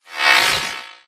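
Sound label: Drone Movement Turn; Titanfall 2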